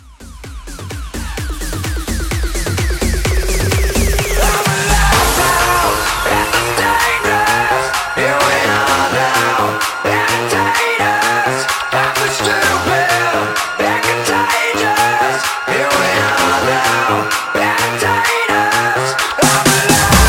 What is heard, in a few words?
Mashup Bootleg 128